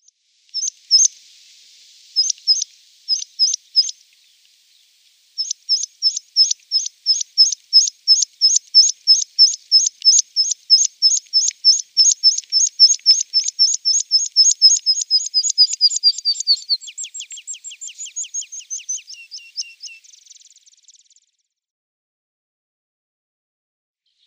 Meadow Pipit song

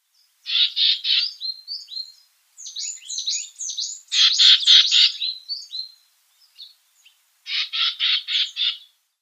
Algerian Nuthatch calls

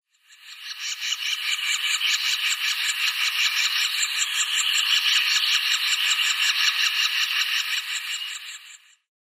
Middle Spotted Woodpecker nestlings

